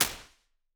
Hybrid Early Reflections Blue Room, Impulse Responses; Ableton Live 12 Lite